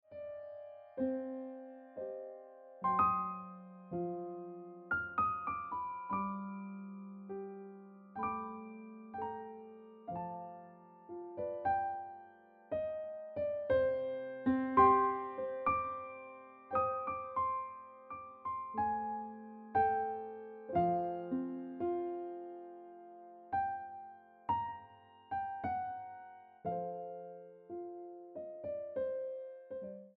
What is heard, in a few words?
Cool Breeze Ringtone Piano Music